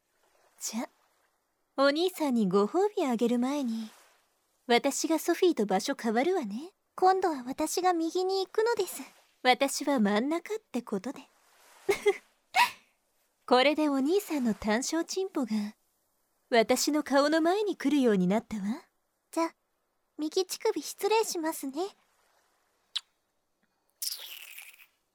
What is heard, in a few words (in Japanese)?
ご褒美フェラ